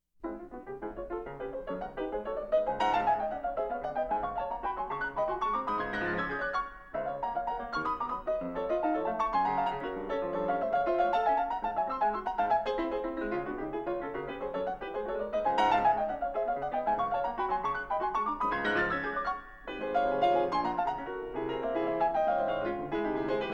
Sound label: Etude for piano in D flat major; Scriabin